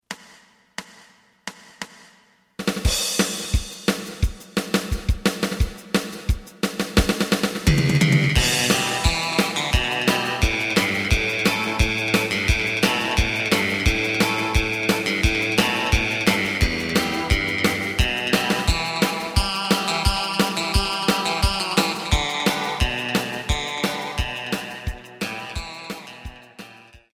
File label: bass